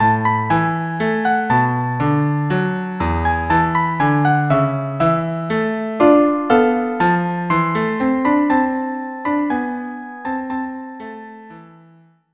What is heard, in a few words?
violin cello duo; Torna a Surriento; Composer: Ernesto de Curtis, arr. David Gable; Instrumentation: Violin and Cello